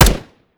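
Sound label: weapon fire player; gmod; viper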